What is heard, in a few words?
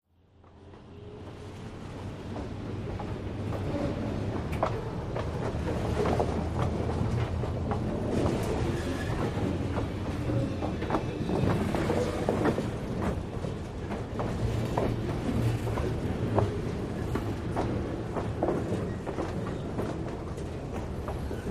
Train Slow Cu W squeak